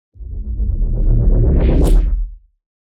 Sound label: Whoosh